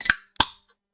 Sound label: soda spray